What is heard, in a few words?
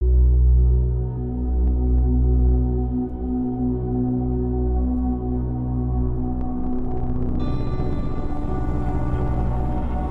Misty Drone Full